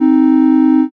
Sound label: horn